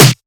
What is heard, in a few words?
steel snare drum sample c key